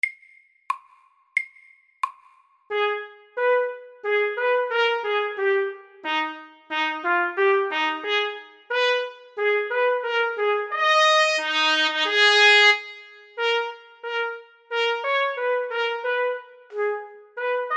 Etyde i B moll MIDDELS

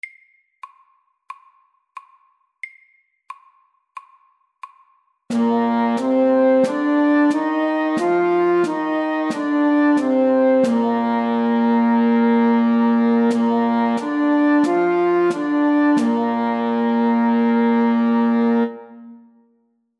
B dur natura

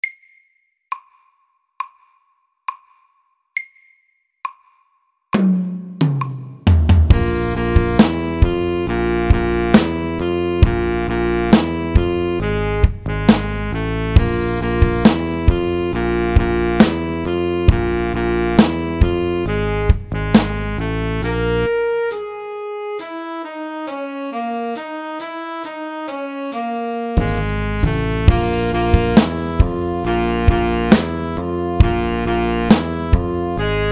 funk SAKTE

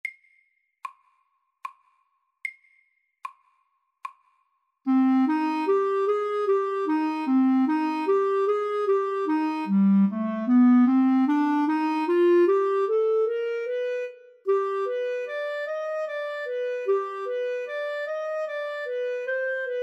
D moll etyde SAKTE

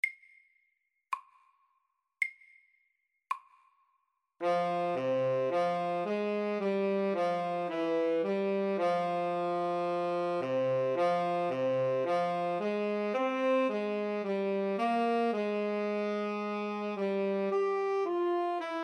Etyde i G moll SAKTE

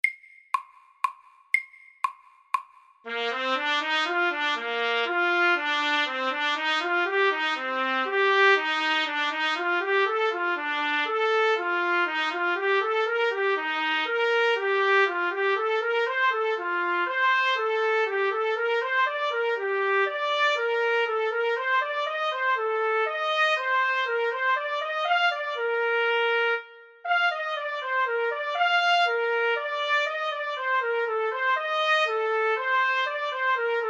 Etyde SAKTE

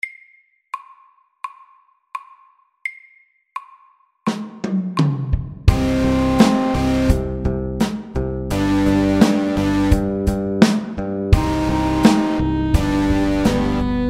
Elektrisk rock SLOW 85

